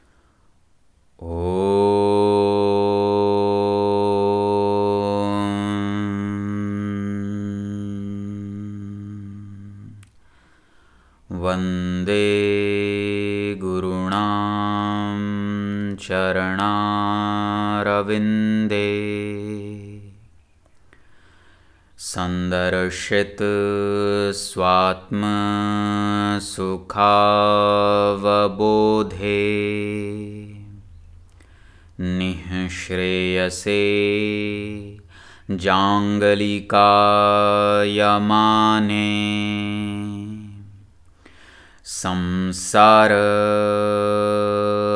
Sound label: ashtanga vinyasa yoga opening chant